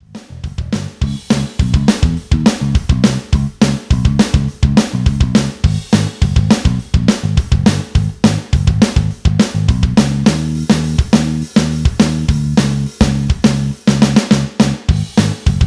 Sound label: Cracker Blues